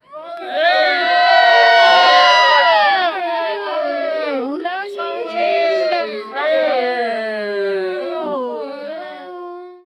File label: a group of all female, sea; sound effect